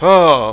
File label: NOAH SIGH